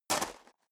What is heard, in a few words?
step sneaker gravel medium single right; schritte